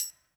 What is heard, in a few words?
KIT, Dance Mega Drums, HIP